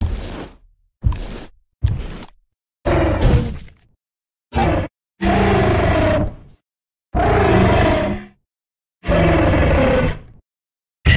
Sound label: walking; thumber